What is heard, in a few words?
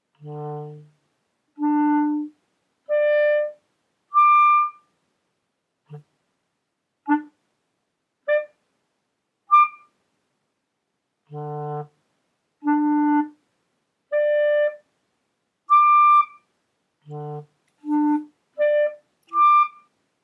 normal staccato long with tongue stop short with tongue stop